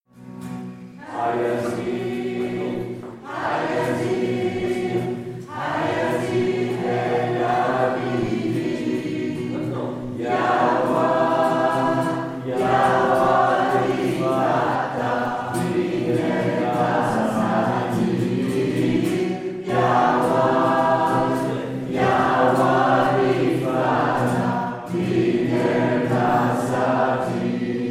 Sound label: unisson basses